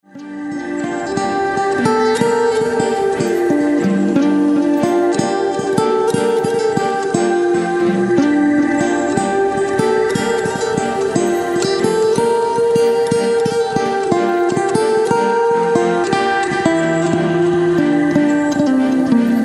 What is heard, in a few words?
CELTIC FAIRY DANCE